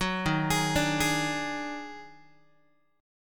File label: D sharp Minor Major 11th D sharp Guitar; arpeggiated